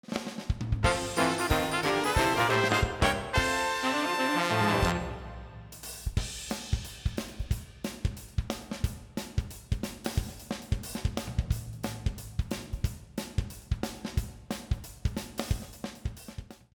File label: Stage Clear